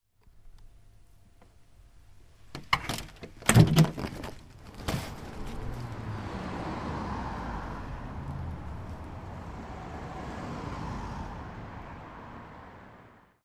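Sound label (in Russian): открытие окна утром в городе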